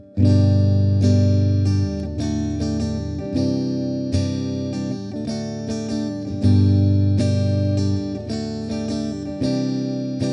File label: Pedal